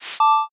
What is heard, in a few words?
transition